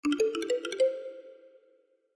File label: Iphone Type